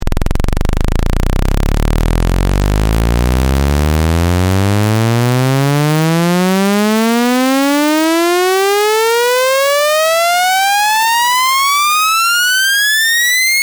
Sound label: saw sweep 2048 trunc 20 20k base20 variable limit 64